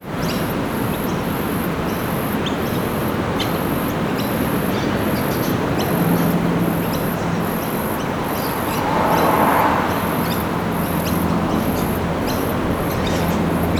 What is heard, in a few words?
village by beach summer atmos birds distant helicopter distant traffic